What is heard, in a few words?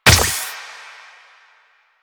Grenade Bounce; Enemies; Soldiers; Weapons; Warframe; Lasrian; Goonadier